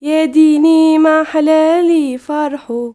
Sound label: YOUNGIRL, Arabian Traditions